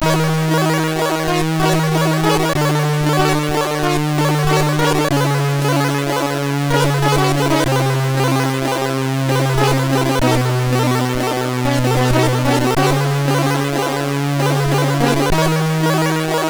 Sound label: Melancholy, MOS6581R3